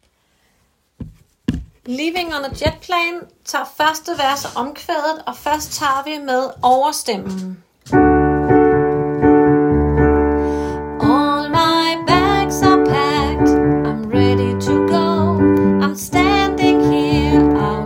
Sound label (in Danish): overstemme, kor